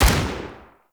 Rifle Shot; Warframe; Tenno; Sundial Rifle

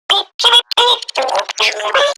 Garden Drone Vocal; Warframe; Zariman; Zariman Gardener Drone

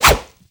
Wolf Swipe, Animals; Duviri

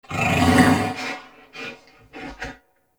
Wolf Ambient Call; Animals; Duviri

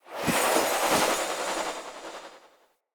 Casting Device Projectile Whizz by; Weapons; Player; Galene

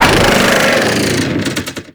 Thumper Pain Groan; Grineer Thumper Bot; Warframe

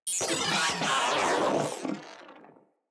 Moa Vocal Death

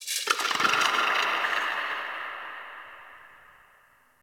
Venus Landscape Cave Creature; Warframe; Venus Open World; Ambience

